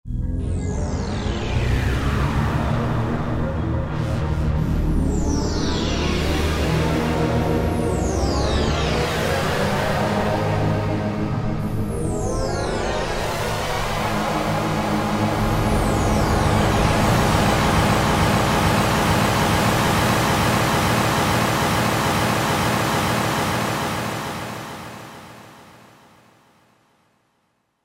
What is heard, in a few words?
Roland JD-990; Psycho (..); Factory Patches examples